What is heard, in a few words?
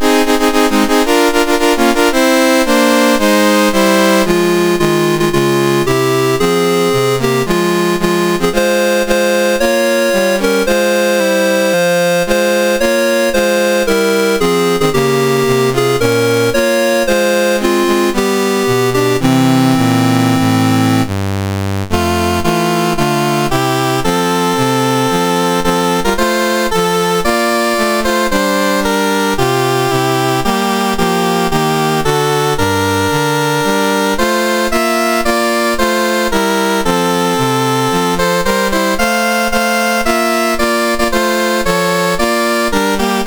MOS6581R4, National Anthems; Trinidad